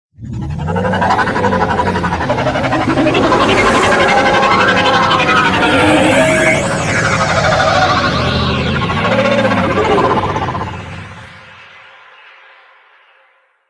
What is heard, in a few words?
Liset Level Docking; Warframe; WM Liset